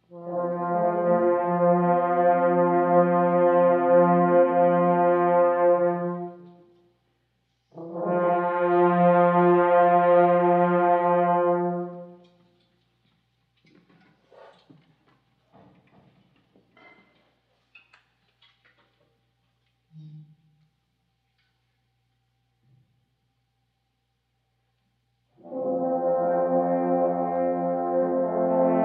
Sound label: Horn Choir; How to Train your Dragon; Vandegrift High School Band